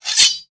metal slide metal; gamerip; shattered horizon 2009

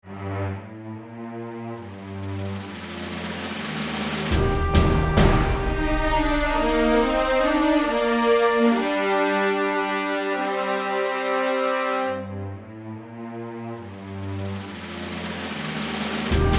Casino Amb, soundtrack

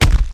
Ruins Impact Debris

